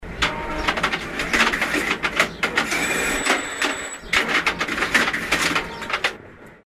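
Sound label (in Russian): каретка подала деньги в щель для выдачи и вернулась обратно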